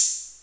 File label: sharp hat one shot A key; hi-hat